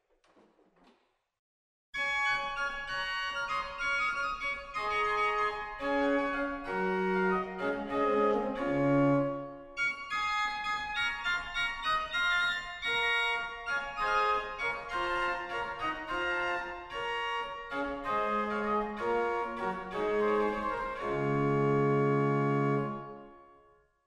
Liebl Principal 4, organ; waltershausen

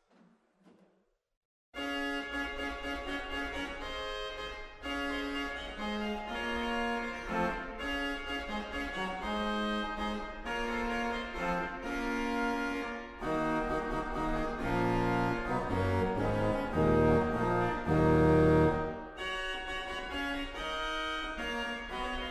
Trompetta 8, organ; waltershausen

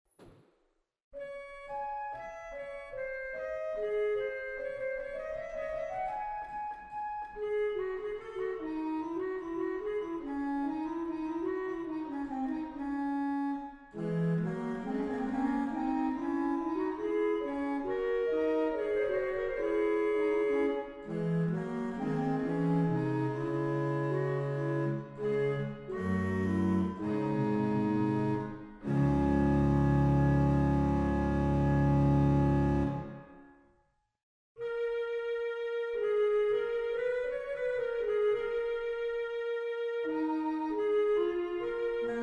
Vox Humana 8; waltershausen

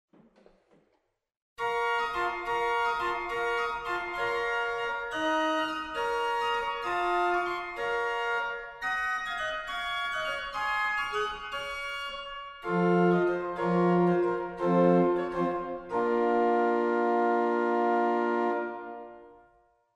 Principal 4; waltershausen

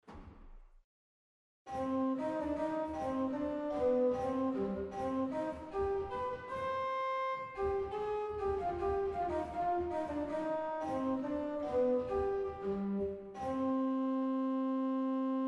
Super Octava 4, organ; waltershausen

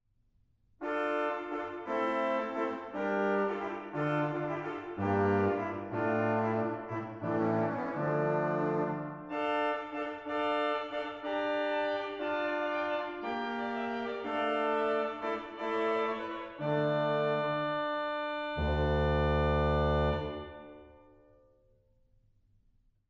Trommet 8; stade

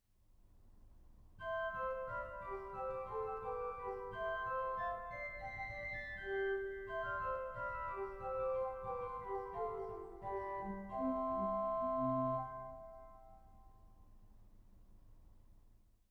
Flauto Traverso 4; 1844 Franz Xaver Engelfried Organ; goenn

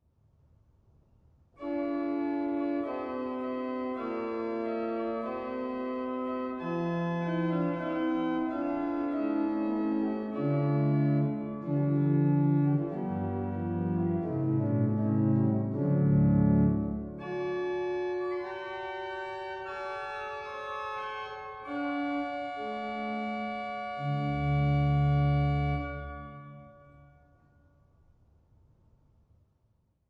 Principal 8, organ; dortmund; Sauer

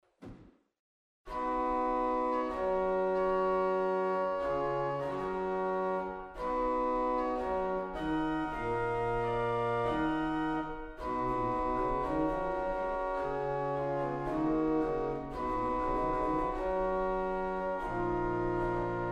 Viol Gambe 8, organ; waltershausen

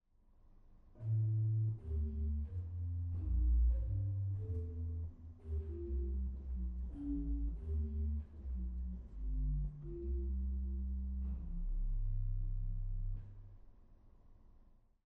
Subbass 16, organ; goenn

